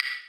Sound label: Wood, Block Stick; Equipped Music; Loungin House